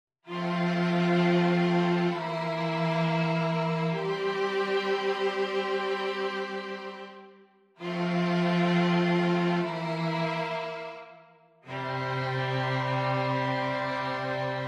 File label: STRING TRIO